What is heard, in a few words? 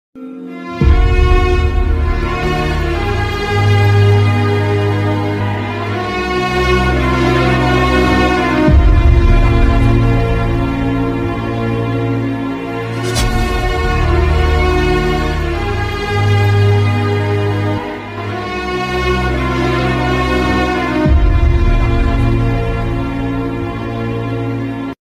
sad bgm heart touching ringtone south movie ringtone; ismart shankar